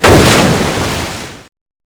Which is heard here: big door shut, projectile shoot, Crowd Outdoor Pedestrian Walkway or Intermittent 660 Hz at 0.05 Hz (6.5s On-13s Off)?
projectile shoot